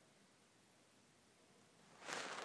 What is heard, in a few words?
boooo